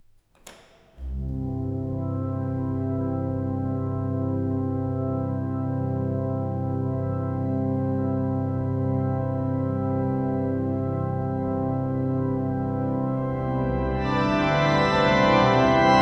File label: one person plays toccata, classical